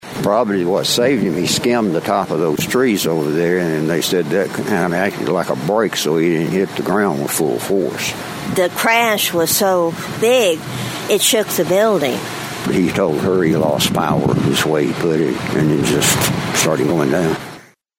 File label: plane crash